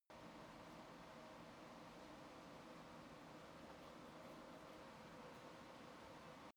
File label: workshop garage